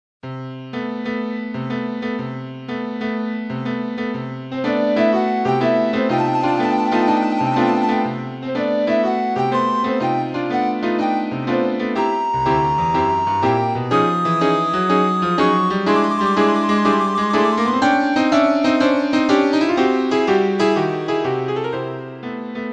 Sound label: recorder suite